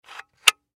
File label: Door Lock Slide, Door Lock Slide Lock; Unlock; Blastwave